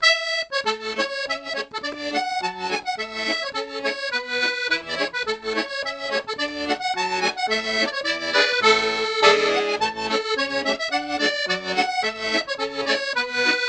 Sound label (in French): Accordeon touche